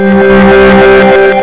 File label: erase